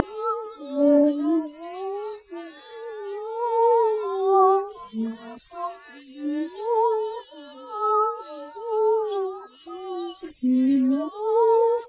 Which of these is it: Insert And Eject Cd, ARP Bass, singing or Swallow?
singing